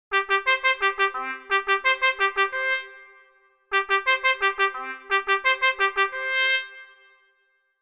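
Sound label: Bugle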